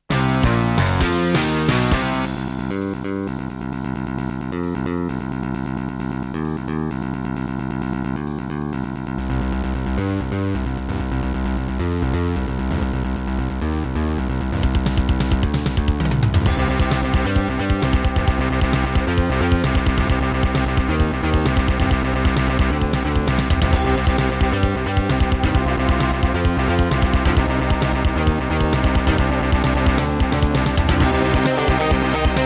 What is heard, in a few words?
Roland SCB-7; sigil doom episode 5